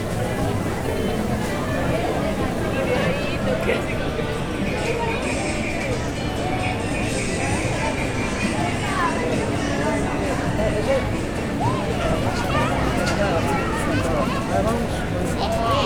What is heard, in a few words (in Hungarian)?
sétáló utca közlekedési rendőr